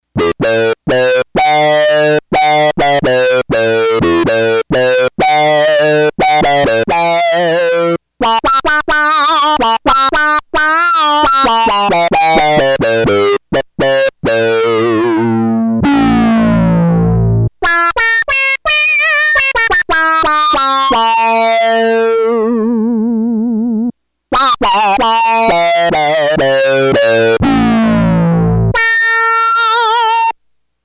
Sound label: Sync II